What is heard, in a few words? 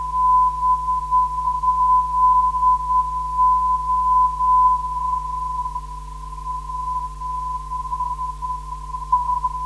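363kHz, non-directional beacon; PI-BZO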